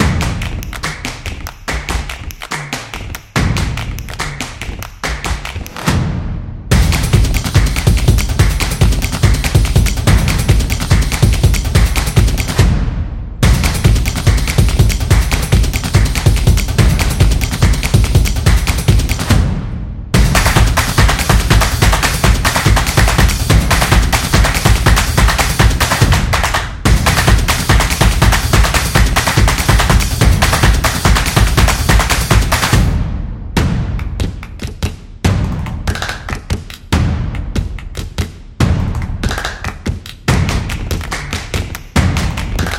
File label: Upbeat Drums Stomps